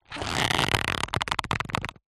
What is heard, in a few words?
foley cardboard box small pressure applied stress creaks